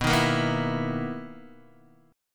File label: B Major 11th B, guitar